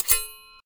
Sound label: shield metal, attack impact